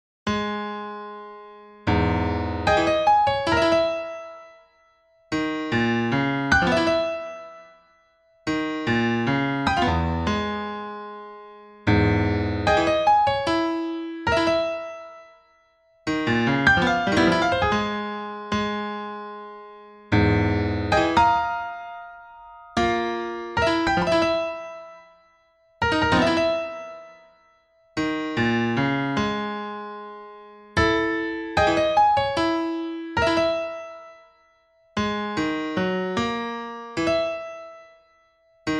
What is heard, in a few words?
Treble Melody